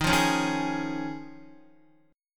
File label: Eb 7th Suspended 2nd Suspended 4th down Guitar; Eb7sus2sus4 strummed; JGuitar